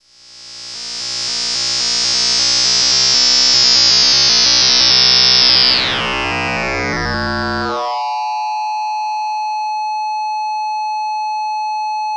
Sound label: electric, special FX twisted; USB Soundscan; AKAI